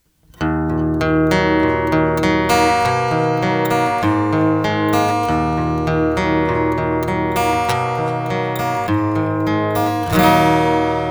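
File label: reverb et delay; tc electronic g natural; Les délais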